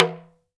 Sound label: TALK DRM, Heart of Africa; Spectrasonics